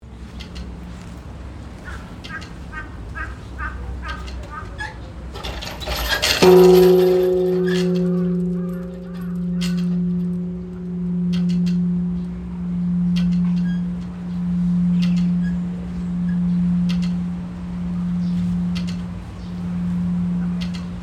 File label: Ringing the peace bell in Hiroshima; Japan